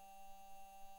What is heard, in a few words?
mic, mars2020 edlcam